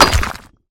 Stone Damage; fowl

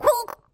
Bird Blue Collision; fowl

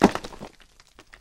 Stone Collision; fowl

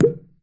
Bird Blue Special; fowl

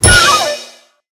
Cherry Center Destroyed, Blocks; fowl

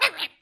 Bird Red Talk; fowl

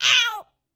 Bird Damage; fowl; Bird Red

